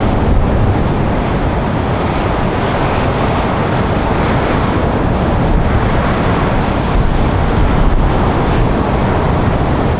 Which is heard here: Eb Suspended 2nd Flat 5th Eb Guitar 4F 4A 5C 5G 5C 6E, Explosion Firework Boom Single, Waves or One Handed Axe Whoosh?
Waves